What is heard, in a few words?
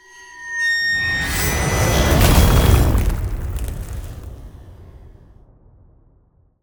Ice Spell Barrier Defense; Arctic Veil; Arctic Aura